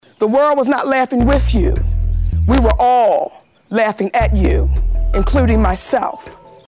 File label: laughing at you